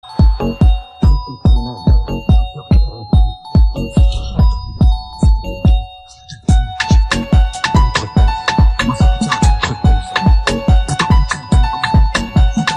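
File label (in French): Karaoké instrumental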